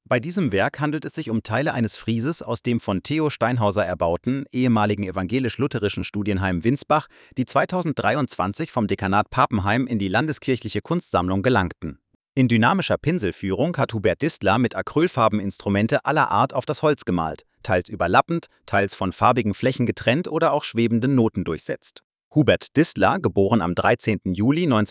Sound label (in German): Musik; Kunstsammlung Hubert Distler Musik; Musikinstrumente - Hubert Distler